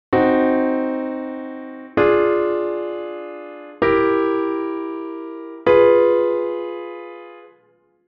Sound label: dim chord sound